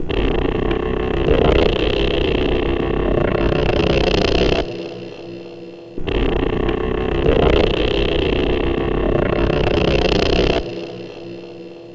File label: Engaged Gears; Sonic Foundry (Sony Creative Software); Loop Noir